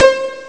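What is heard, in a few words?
pizzicato; Amiga Soundtracker sample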